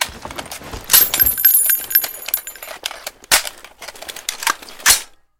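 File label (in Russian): упавший патрон при перезарядке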